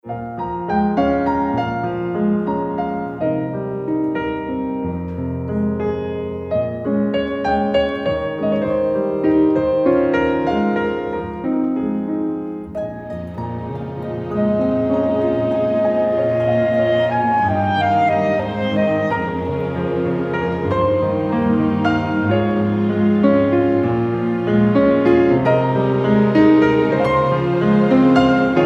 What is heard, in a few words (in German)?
klavierkonzert langsames thema; orchester; 1 satz